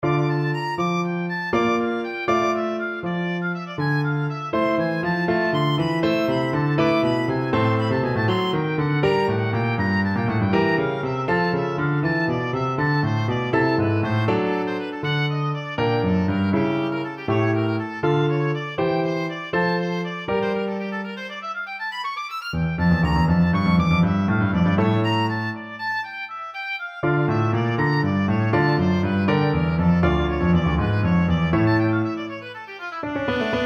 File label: bach, oboe